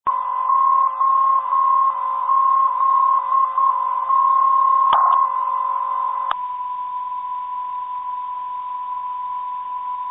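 312 kHz 400 24 8 Hz filter, non-directional beacon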